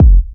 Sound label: Kicks Electro